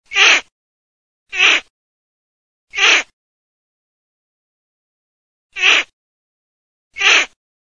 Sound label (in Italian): MORIGLIONE